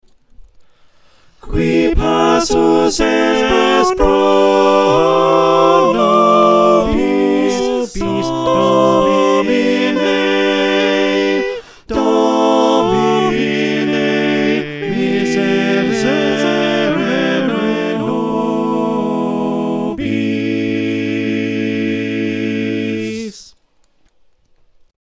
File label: Adoramus Te Christe - SATB